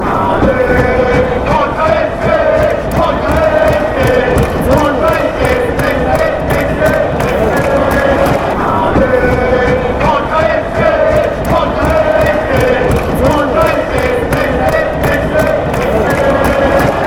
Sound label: our sge fanchants